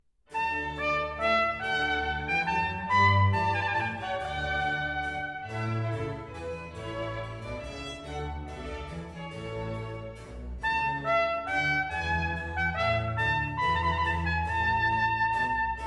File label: baroque duet